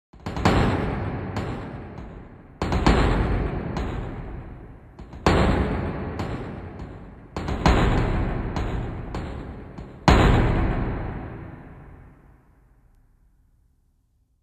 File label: mighty hits